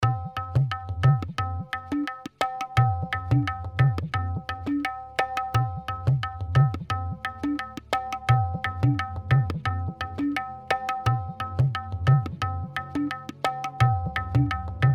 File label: Fminor 87bpm